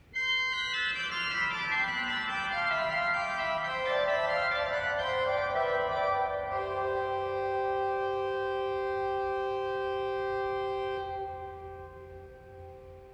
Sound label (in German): mixtur 4f 1 1/3, Mixtur 4f 1 1/3'; kirchenmusik; pfeifen; gereon koeln